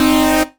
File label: Synths Root C